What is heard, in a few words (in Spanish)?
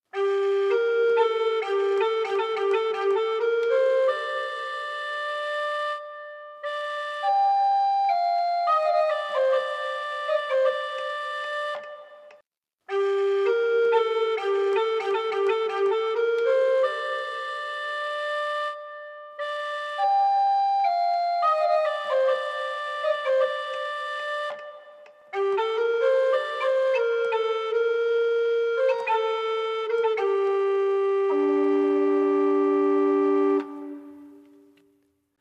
Organo